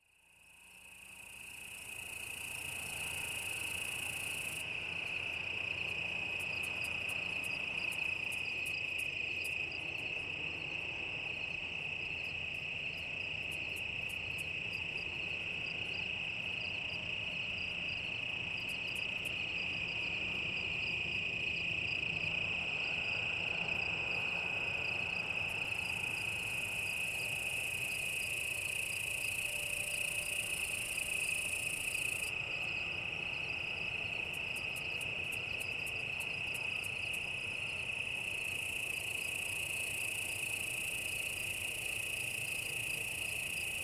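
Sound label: Cicada Close Up Inconsistent; cicadas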